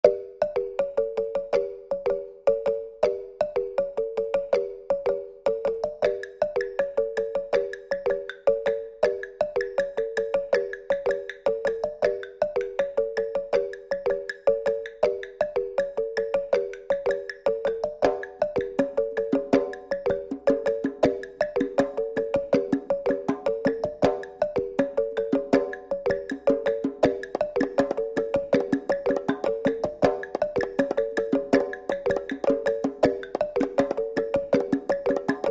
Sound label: Ethno ReFill